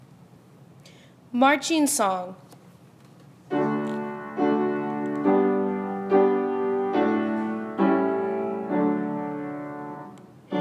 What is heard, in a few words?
Marching Song with Piano